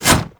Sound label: Melee Block Stop; Warframe; Wraith